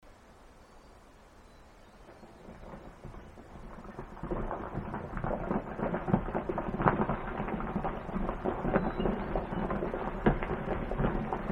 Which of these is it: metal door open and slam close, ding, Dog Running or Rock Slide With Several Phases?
Rock Slide With Several Phases